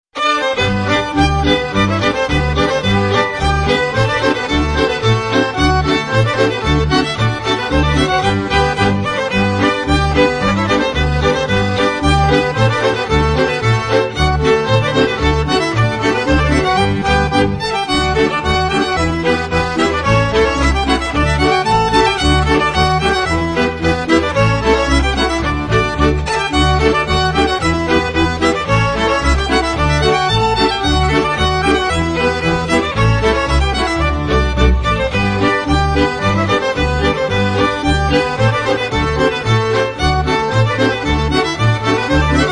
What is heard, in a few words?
polka; nibe